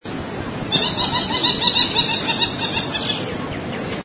jacana

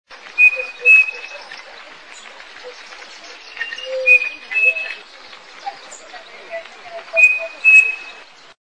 voz crespín

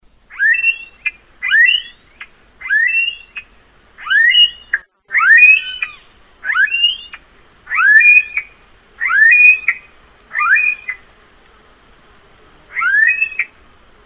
anó chico, voz; crotophaga ani; las aves

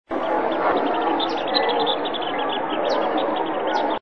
voz chorlito de collar

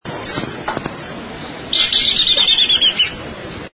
voz chinchero

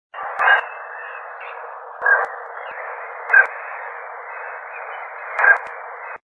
maicero

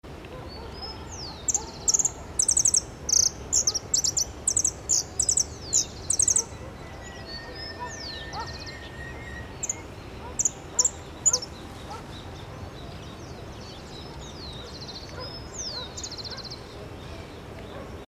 jilguero dorado voz

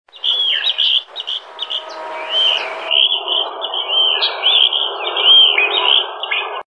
voz gallineta común

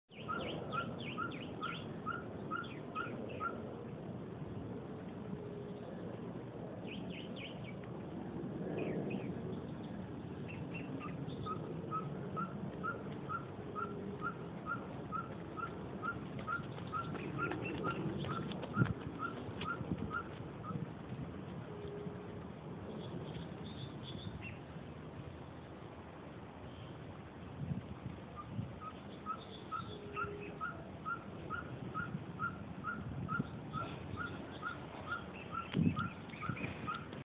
Cabure

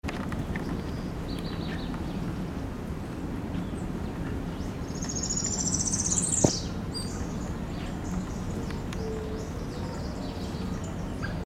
pitiayumí voz

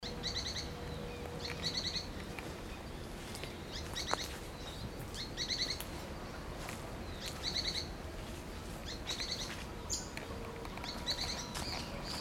pijuí plomizo

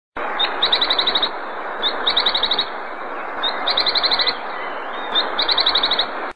voz pijuí plomizo

